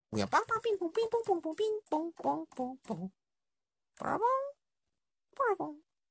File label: cute noises